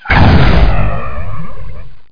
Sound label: fire, raven; Hexen II